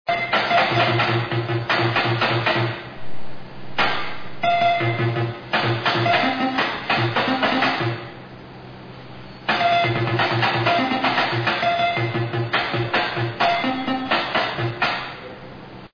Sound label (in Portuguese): percussao